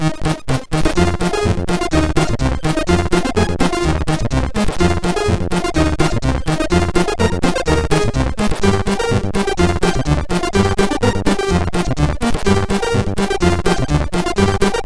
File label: Short, MOS6581R3